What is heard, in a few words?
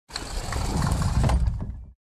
Metal sliding door opening or closing